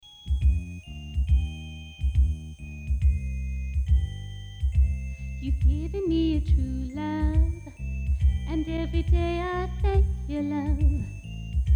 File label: 50s & 60s